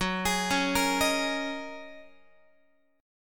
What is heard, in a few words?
F sharp 6th Add 9th F sharp Guitar; arpeggiated; JGuitar